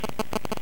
Static

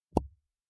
Plunger Pop

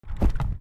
Sfx jump landing ground

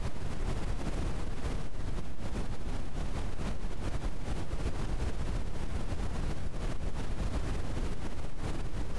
Vhs Video Tape Noise

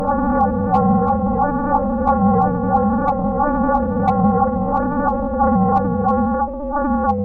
Ambient Space Sound

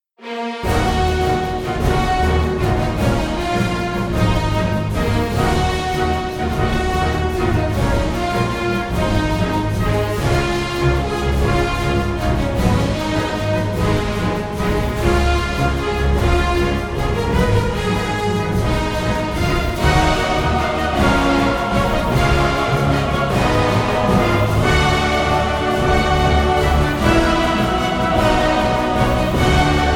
Epic Orchestral Adventure Theme

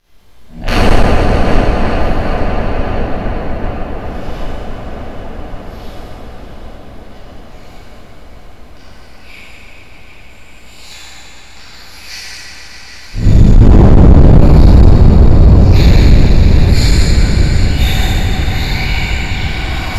Ghost

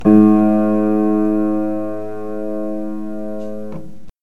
Piano

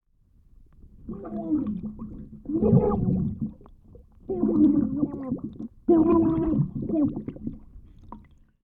Man Drowning Underwater

